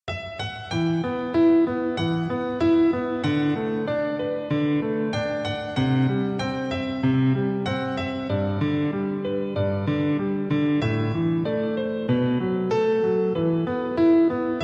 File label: sad love story new piano; Piano Score